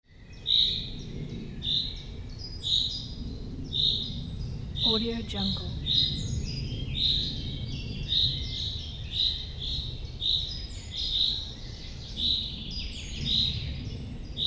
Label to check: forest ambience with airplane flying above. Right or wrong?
Right